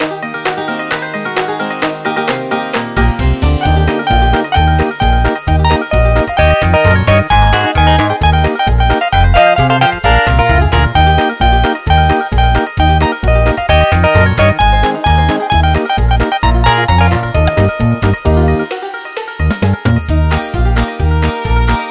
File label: Jingle Bells Bluegrass